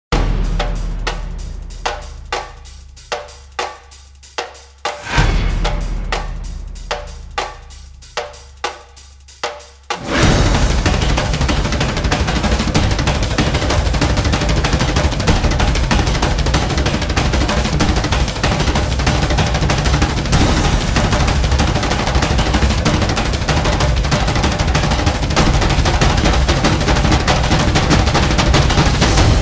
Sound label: Percussion